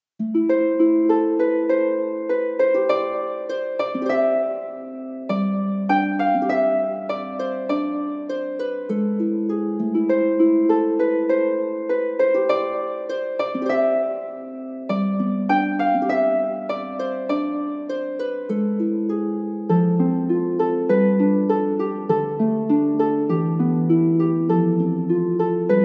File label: Harp